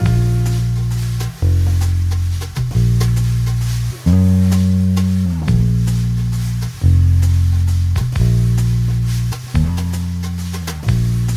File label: solo loop